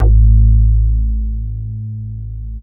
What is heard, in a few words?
attack, BASS, Techno Trance; Techno Trance Essentials